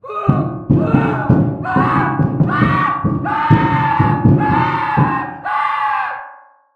burning scream car; centaura

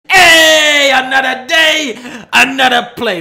applause

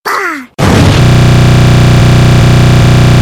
boom error

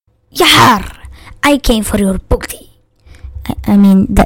augh; I'aaaaaam gayyy

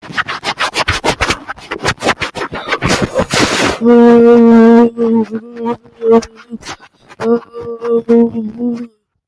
loudest ever

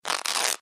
cloth rip

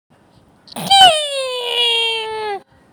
airhorn

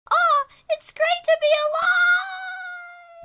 ice cube scream; bfdi

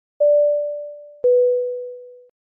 airplane beep sound effect

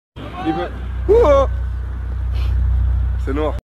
ouh oh

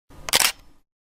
camera click

